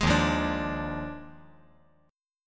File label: D sharp Major 7th Suspended 2nd Suspended 4th; strummed; JGuitar